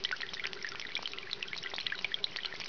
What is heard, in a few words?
small wind